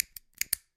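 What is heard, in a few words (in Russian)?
двойной щелчок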